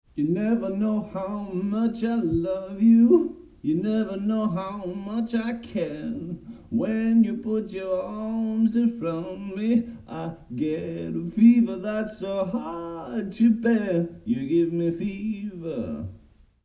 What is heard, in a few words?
Resonators Vocal Cardboard Box Stereo